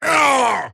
pain; axe

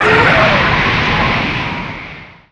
wildkin summon tornado; weapons; dota; creep; neutral

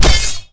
heavy sword impact; dota

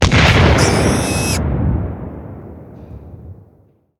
arc suicide; techies

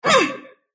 sylph pain; dota; dark willow

